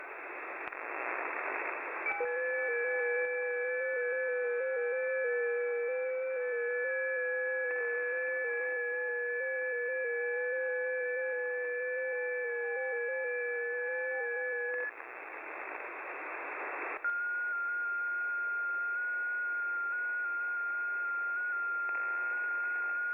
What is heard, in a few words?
FT8 mode usb; receptii digitale